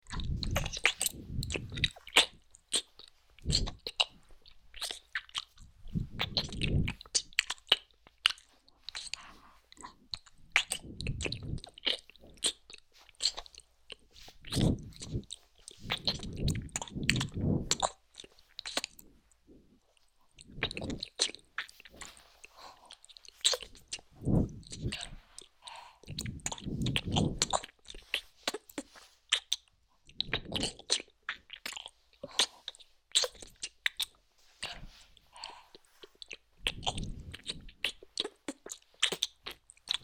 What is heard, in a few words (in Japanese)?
添い寝 W 耳舐め, リアルサウンド; エクストラ